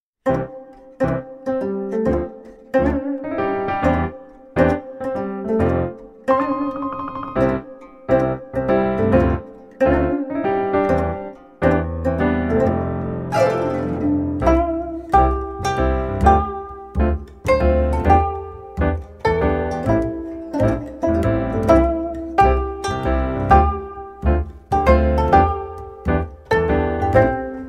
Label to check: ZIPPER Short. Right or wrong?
Wrong